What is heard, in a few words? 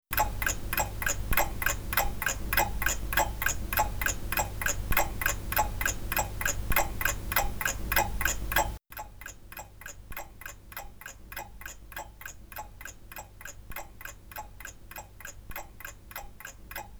rode k2 noise; Test mikrofon